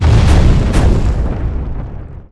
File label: Sting Eruption; Gamigo